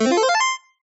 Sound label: Ball, Skee-Ball; TheStable; Pyralix